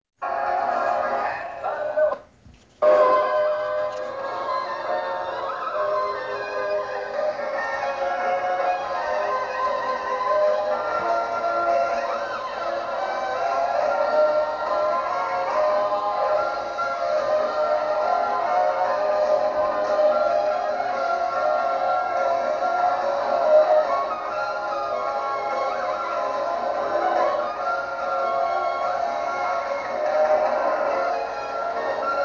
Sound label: circus high notes